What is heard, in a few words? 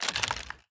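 inspect movement, weapons; valorant; rgx 11z pro vandal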